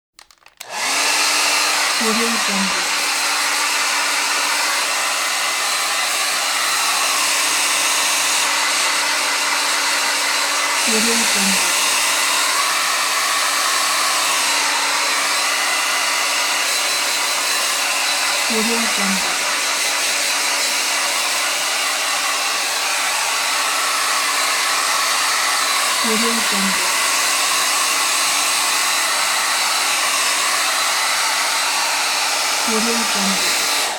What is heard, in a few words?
hair dryer